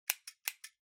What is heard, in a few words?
Stapler Click